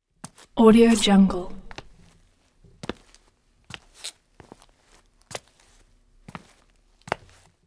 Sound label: footsteps in dancing shoes